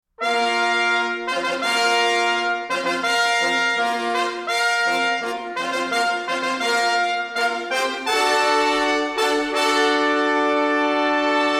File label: Heraldic Trumpets, Orchestra Choir Tracks; eng